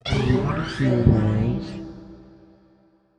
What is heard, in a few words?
cat